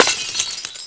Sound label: glass break